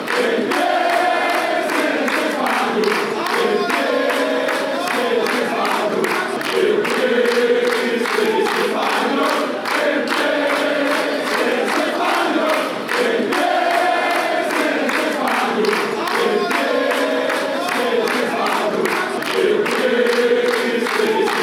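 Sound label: fanchants, football chants; de pie si eres español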